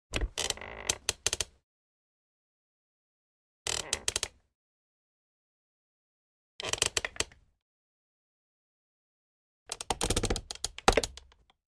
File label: Chair Office Creak X4